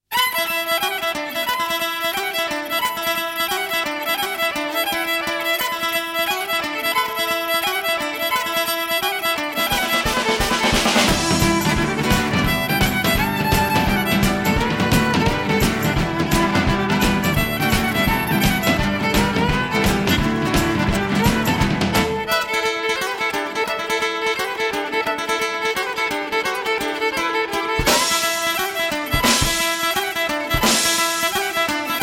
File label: Irish Jig